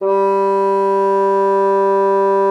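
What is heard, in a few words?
bassoon forte G3; Xsample VOL-6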